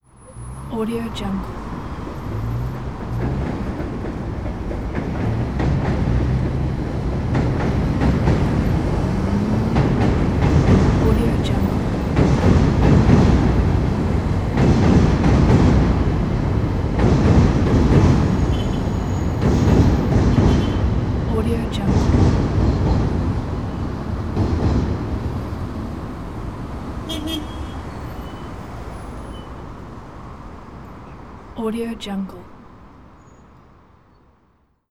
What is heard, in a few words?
locomotive rides on bridge in city